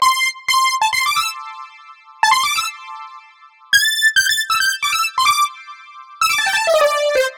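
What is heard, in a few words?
A Brass Accents, 130 bpm; Zero-G; Future Wave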